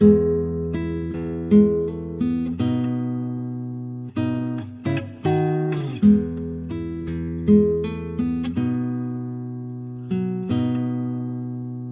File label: guitar, Loops, Sad